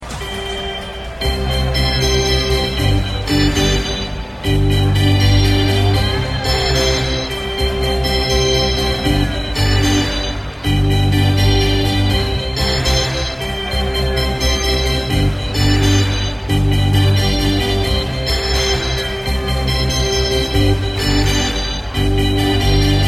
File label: Organ Hockey Tune